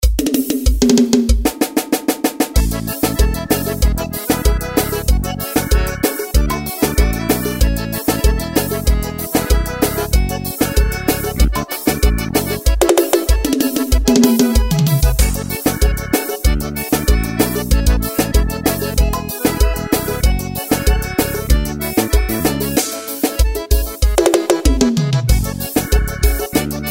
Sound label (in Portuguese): forro